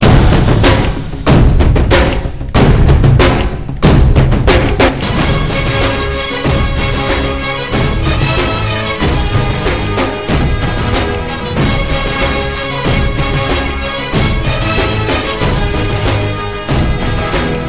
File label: Drumzzzzzzzz